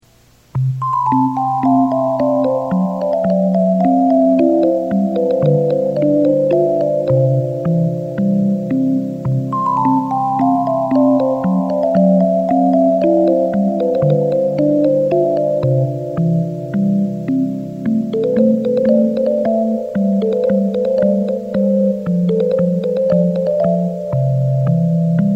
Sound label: sparrow